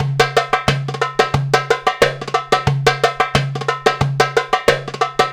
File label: PERC LP, Arabian Traditions